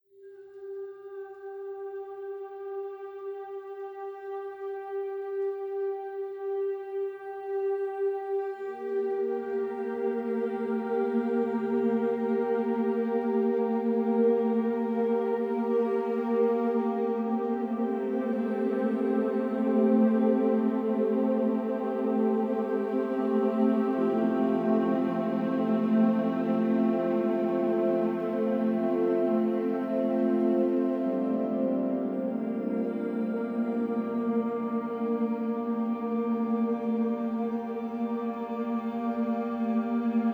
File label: Music for Cats Deluxe Jazz Music Therapy For Cats